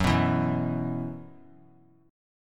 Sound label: F sharp 7th Suspended 4th; F#7sus4 strummed